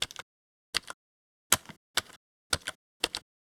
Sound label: Keyboard slow